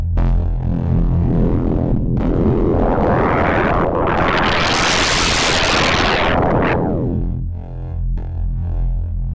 bass low fm; makenoise spectraphon

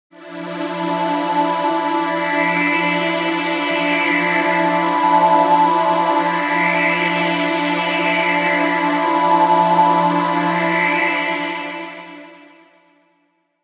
Silky Swirls; Cherry Audio PS-3300

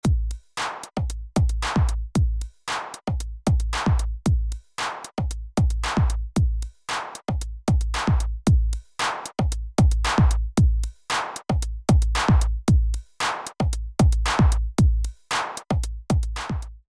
Lindell Pure

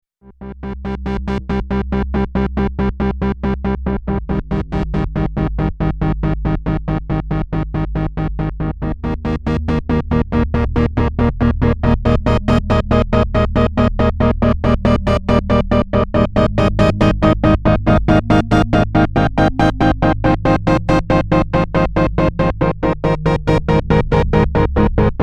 stepped pad

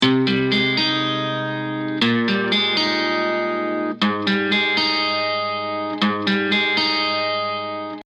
GuitarClean Wet

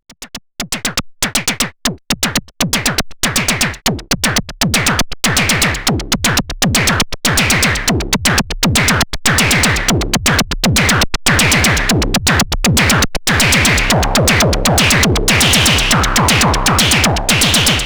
Groove; Body Synths Metal Fetishist

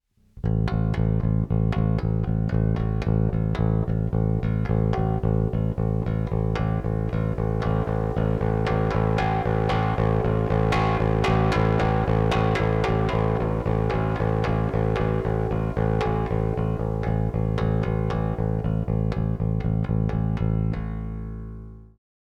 Drive, Bass; SkarBassOne

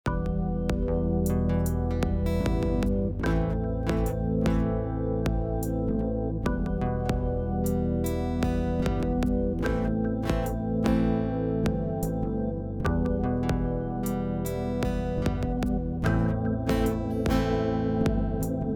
SPL MixDreamXP

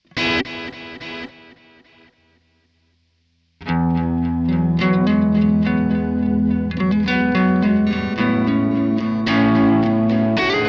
MXR Joshua Ambient Echo Mod Voice

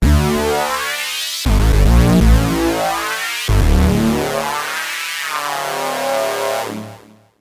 leadsound dist

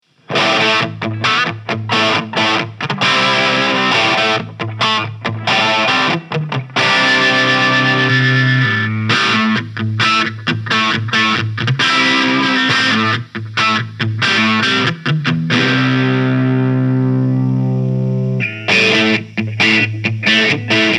Disturbance Filter Fixed; Death By Audio

